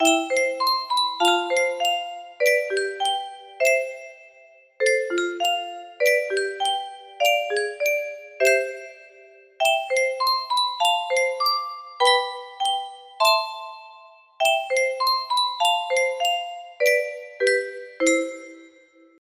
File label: music box melody